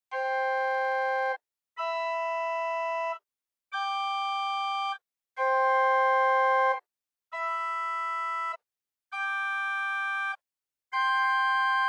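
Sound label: Fourniture arpeggio, organ; Romanswiller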